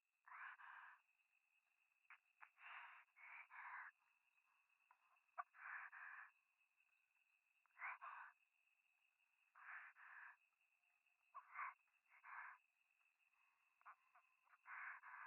左耳 密着 処女 喘ぎ 山奥 夜

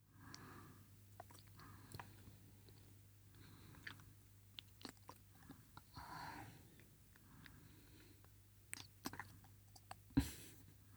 乳首舐めループ音 レロレロ遅め

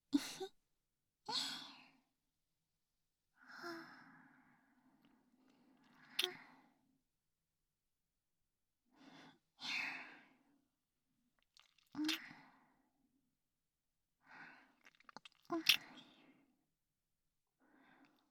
キス; SEあり

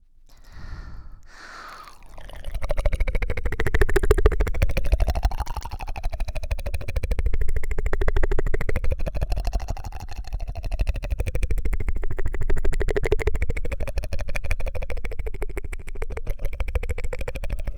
分身エコー高速れろれろコース

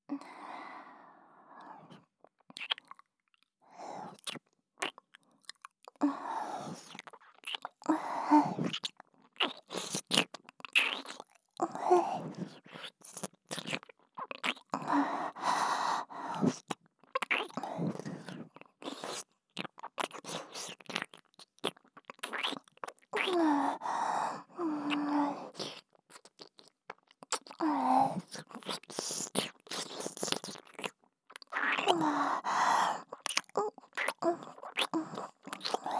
耳舐め, ASMR; SEあり

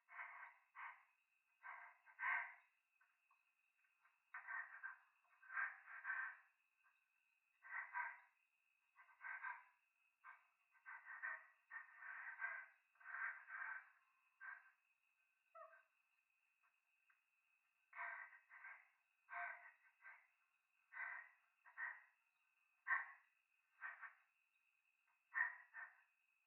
トイレ 左耳 快感 吐息 山奥 夜

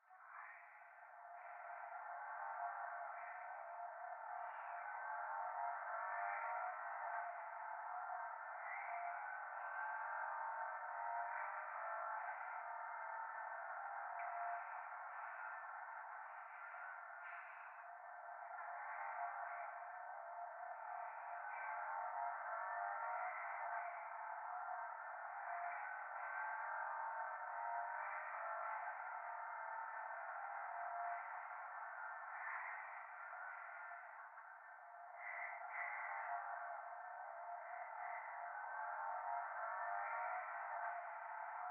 トイレ 右耳 密着 吐息 車の中

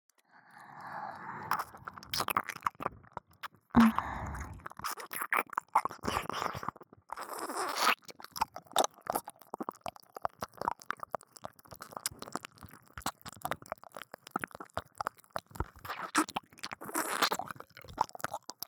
左耳舐め, ASMR; アドリブ集

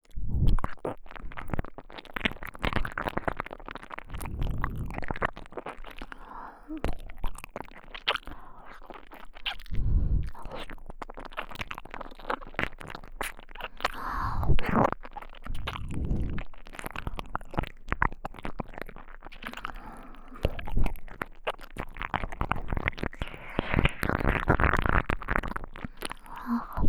左耳舐め 強め

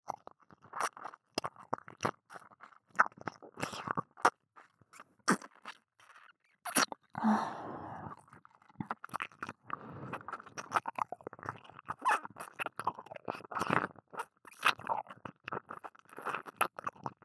右耳舐め, ASMR

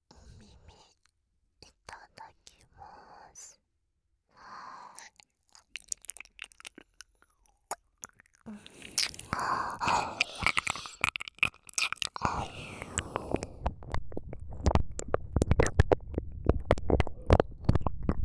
耳舐め, ASMR

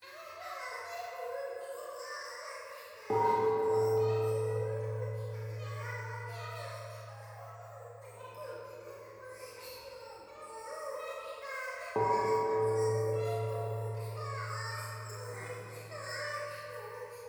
教会 可愛い喘ぎ 神社

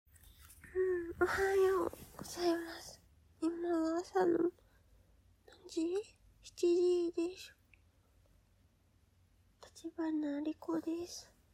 おしっこ実演 朝一番のおしっこ

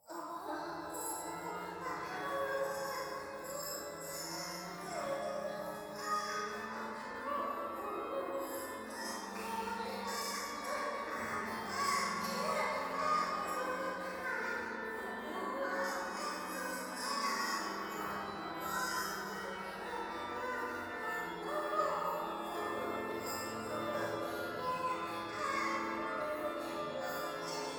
教会 低音喘ぎ 讃美歌

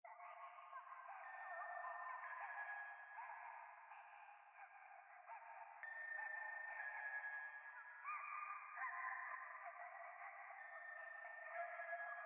可愛い喘ぎ 神社, ASMR

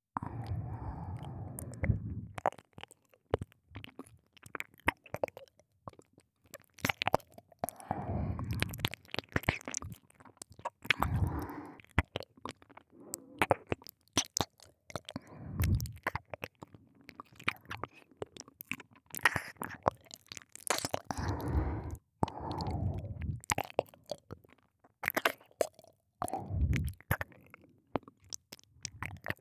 耳舐め

